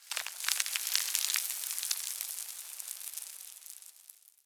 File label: Venus Landscape Dust Scatter, Ambience; Warframe; Venus Open World